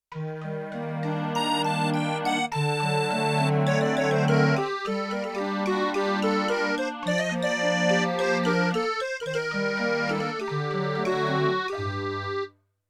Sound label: Musette-Calliope, Yamaha TX16W; Combo Performance 2